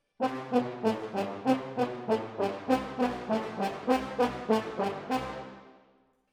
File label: Horns Over